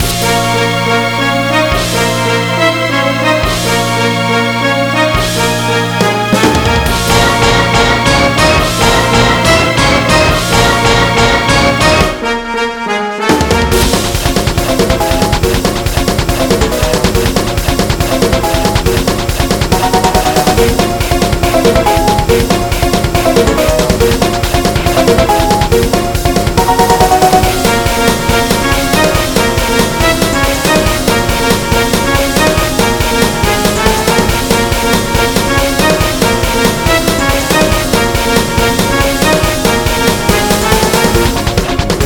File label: remix